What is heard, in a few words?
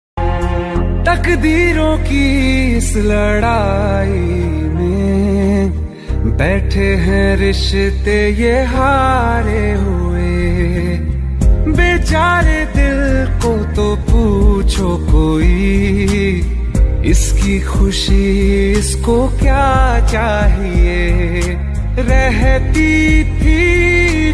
sad ringtone, new song